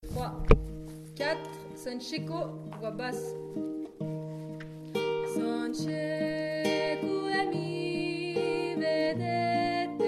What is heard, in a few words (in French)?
BASSE; Son cieco